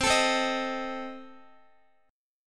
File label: C Minor 6th Guitar, chord; Cm6 strummed